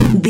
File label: retro impact hit general